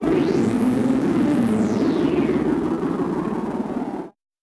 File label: Malfo Syn, malfunctioning synth